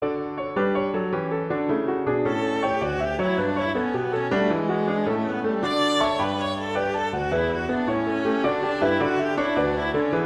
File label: Gigue; Pachelbel; Canon; Vla